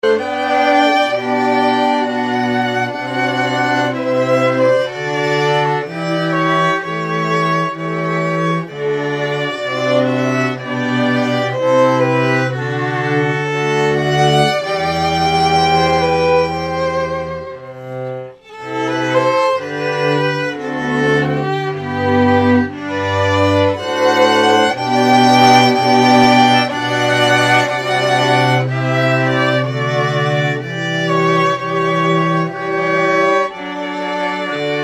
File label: Largo, string quartet; Classern Quartet